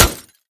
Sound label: manhack, bullet impacts; physics; Half Life Model Pack